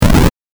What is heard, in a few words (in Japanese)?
8bit ゴリラ